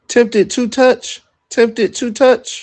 singing

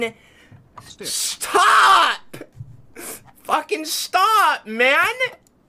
cry

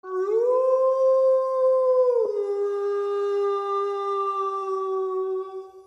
wolf howling

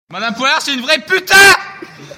vocal

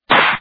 snap

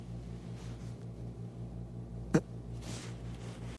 fart sound

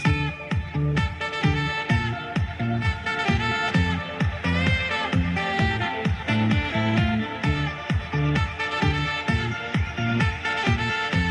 epic sax

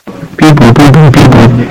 piep pup more louder

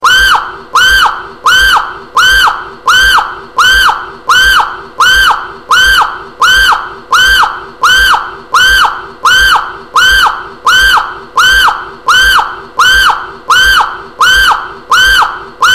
scream

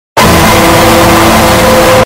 sound effect earrape; law and order svu

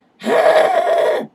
angry sound

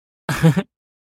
giggle

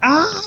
aaaaaa

